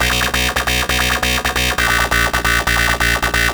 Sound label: Kaossilator gated (130BPM); musicradar